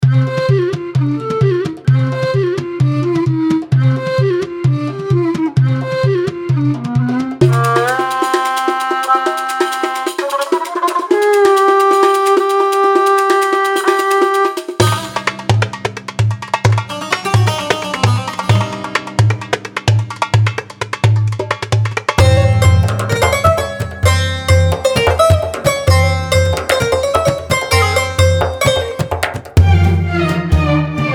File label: Middle East Melodic Instruments Medley; kontakt sound pack